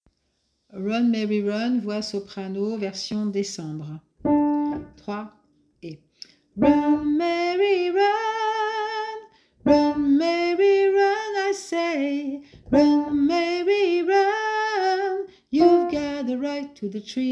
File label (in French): SOPRANO